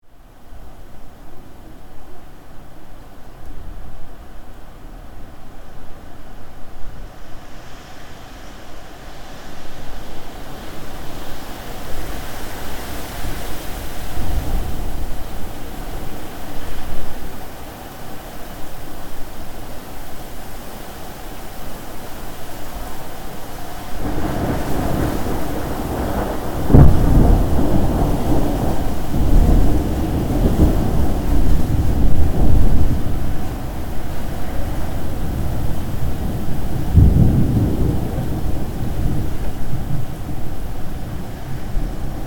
storm coming; nature

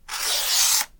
paper box cutter

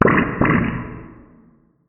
bubble attack, magic

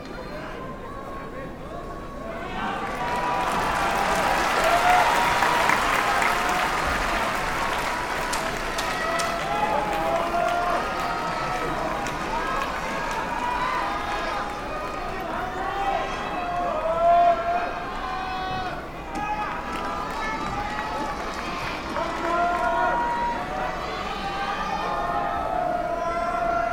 Yokozuna fight

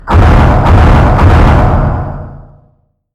robot punches

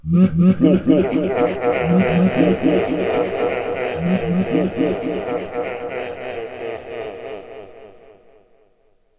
devil laugh; monster

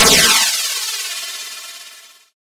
launcher; arms

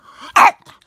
maltese dog; animals

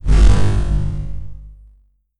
magic waves

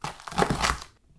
cutting green pepper; cooking

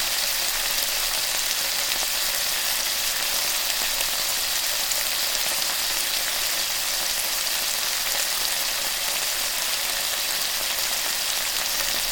grilling; cooking

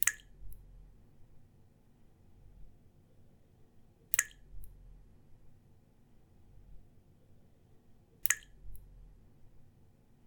leaking washbasin, 洗面所の水漏れ; 洗面所の水漏れ１; wetarea

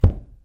put book

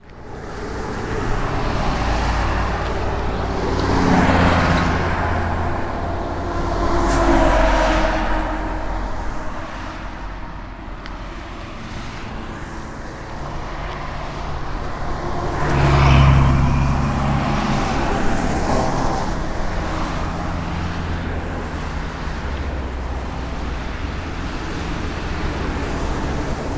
trucks

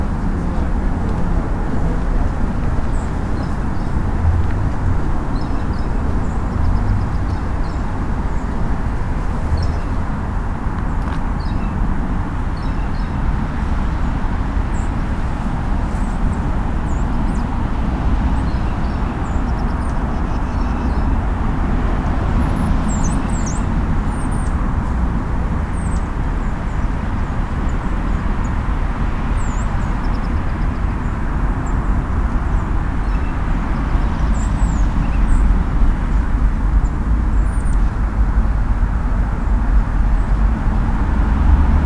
centralpark

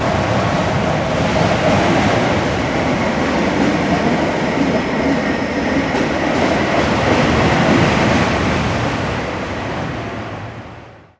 roller coaster

